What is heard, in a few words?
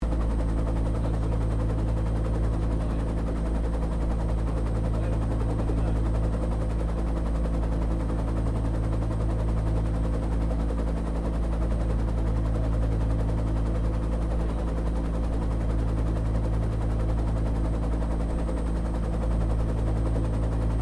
HELICOPTER BELL UH1 IROQUIOS FLYING INTERIOR; RAN UH-1 Iroguios; Helicopters (Sound Effects:Vehicles)